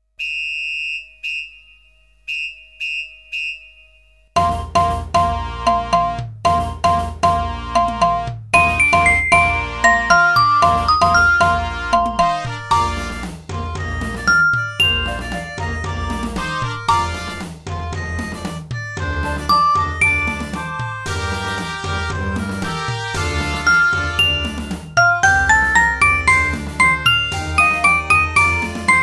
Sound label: BELL; 鼓笛練習用; 鼓笛アレンジ、MIDI、多重録音; パート練習用トラック; ベルリラ＆シロホン; 鼓笛練習用スペシャルＣＤ